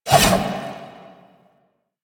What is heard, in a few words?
Two Handed Nikana Spin Swing; Warframe; Duviri; Duviri Dax; Weapons